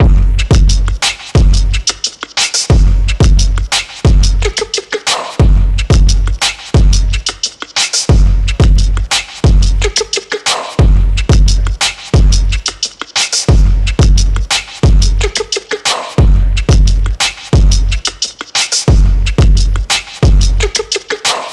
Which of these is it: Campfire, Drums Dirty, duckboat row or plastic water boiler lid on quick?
Drums Dirty